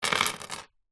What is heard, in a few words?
household jewelry necklace silver charms set down wood table; zapsplat